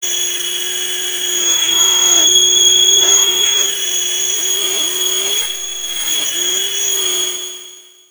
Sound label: loop, techno atmos